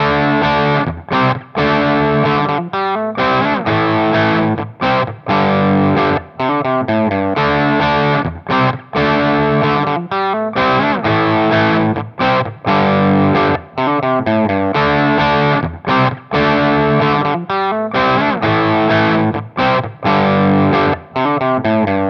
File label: Nux TS; Audiovergleich Mooer Nux